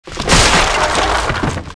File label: toilet; World of Tanks Blitz; objects ordeal